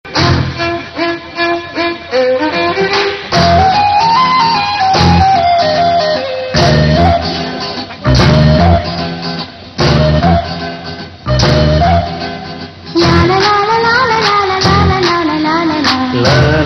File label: Romantic